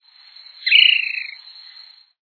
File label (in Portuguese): maria-cavaleira